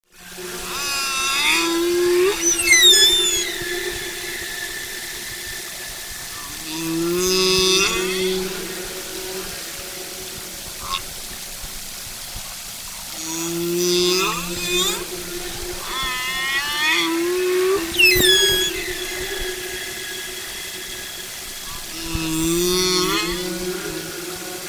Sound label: humpback; whale music